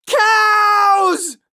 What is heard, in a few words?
dirt cows; donnie; Blind Drive